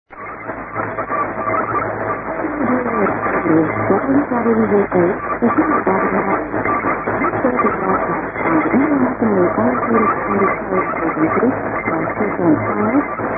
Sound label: usb; nbs maui 'wwvh'; rainer brannolte, d